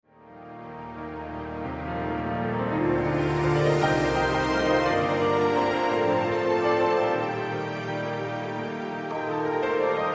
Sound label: Ukrainian Bell Carol